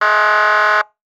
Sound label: Emergency Siren Police Motorcycle Air Horn Short Single; emergency effects; AdobeCC